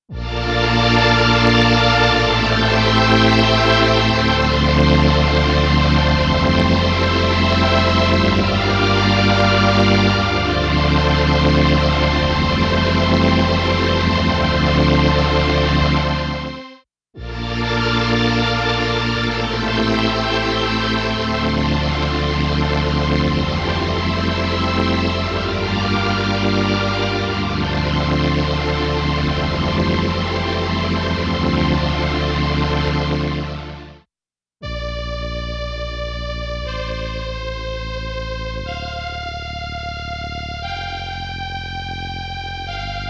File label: string concerto